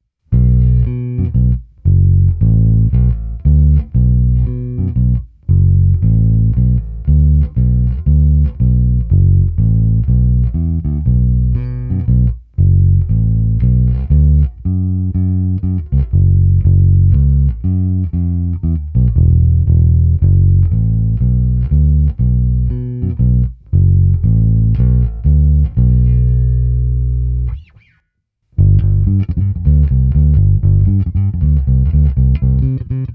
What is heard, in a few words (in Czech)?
Přes Darkglass FW, Baskytara; Přes Darkglass RW; Lakland 44-64